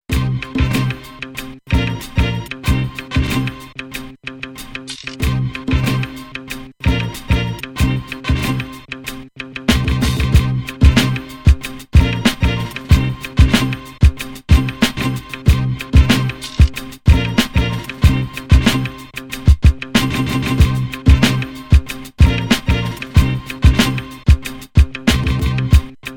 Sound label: beat